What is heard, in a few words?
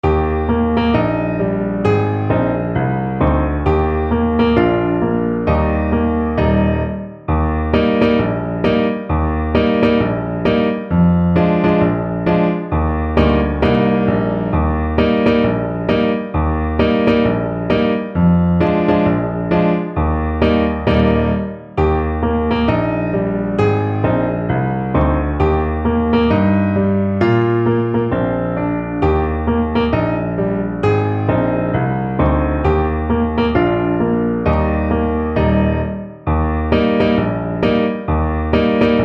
tell my ma, Trombone